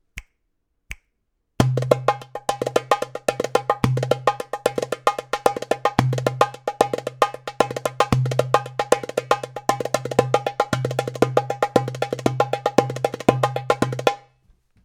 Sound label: wahda kebira, darbuka